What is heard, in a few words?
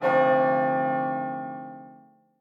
camping bell; vestal